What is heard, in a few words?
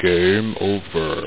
game over